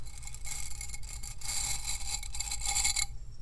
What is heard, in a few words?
Big Metal Brush, toolbox rummaging